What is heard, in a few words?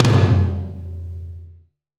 Tom, Gated Reverb; musicradar